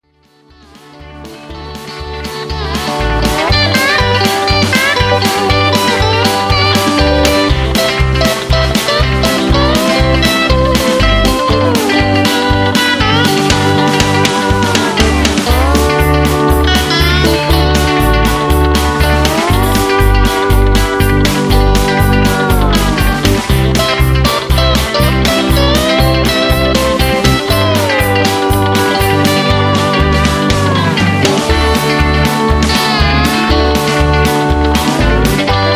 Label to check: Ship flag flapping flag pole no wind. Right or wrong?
Wrong